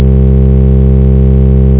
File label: Alto Sax